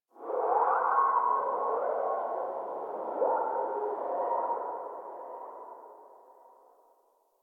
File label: Covered Vine Area Low Chirp; Warframe; Infested Eris; Ambience; Outdoor